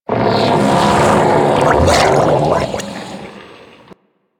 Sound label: Death, Infested; Enemies; Barks; Warframe; Ancient Shell Agent